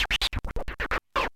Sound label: SCRATCHES